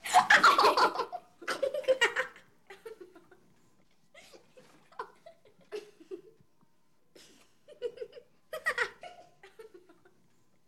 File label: Children Giggle; Human Sounds: Children Laughter